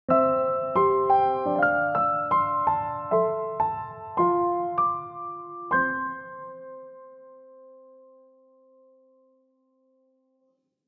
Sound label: Piano Logo